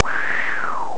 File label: wind long; ST-XX Amiga Soundtracker Sample Packs